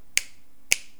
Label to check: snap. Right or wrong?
Right